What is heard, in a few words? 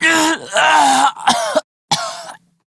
Death Male; Dialog; en; Warframe; Railjack Crew; Red Veil